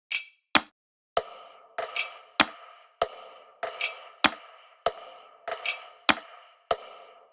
perc loop 130, Pop; Drums; Loops; Slate; SD PASADENA Pop Sample Pack

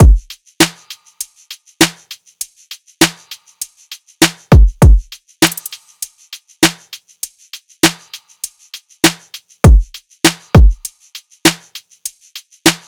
drums 100, Full Drum Loops; Slate; SEISMIC Drum Sample Pack

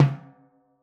timbales Low Center; Raw Perc One Shots; Slate; SD SEISMIC Drum Sample Pack

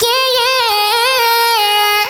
Vocal Sample Pack Phrases Ab; Slate Digital Omnivox Vocal Sample Pack

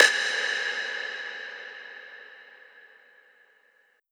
Perc Clav Verby, 909 Percs, Vintage Drum Machines; Slate

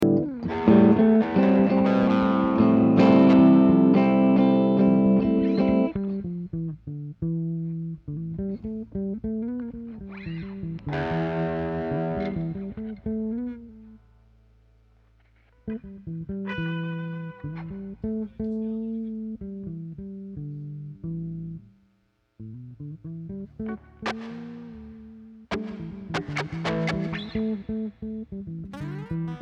Guitars and Bass

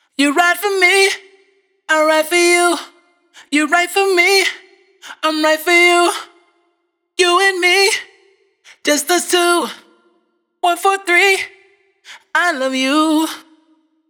hook Love you 140 Eb wet, Vocal; Slate Digital Omnivox Vocal Sample Pack

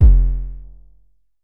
808 one shot F; Slate Digital BASSHOLE Sample Pack

